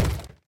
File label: wood, zombie; Minecraft; Votive World